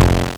Glurggblouk, Drums, MultiMoog

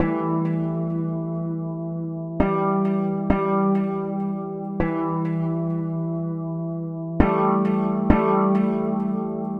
Ambient Melody; Melodics; Drums & Drones

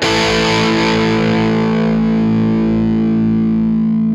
GRUNG SUS4, Guitar

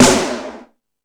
Lazer Drums, E-MU Pro-Cussion; drum machine

